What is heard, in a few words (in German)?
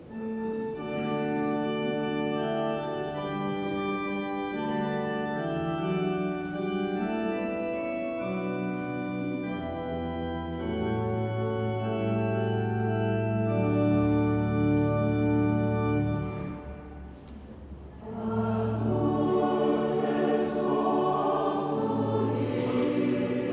Zwischengesang, chor; Erntedank 2023